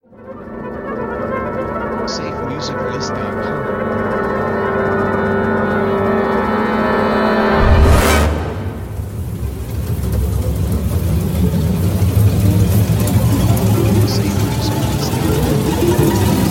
Creepy Suspense Horror Build Up Rising Sound Effects; SafeMusicList